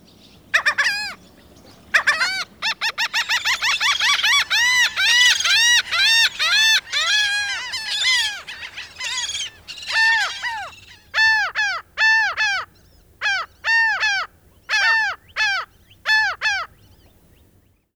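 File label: LAUGH GULL; The Ultimate Birder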